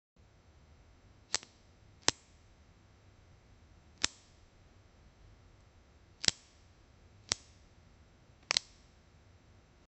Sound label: light metallic clink as h, clothing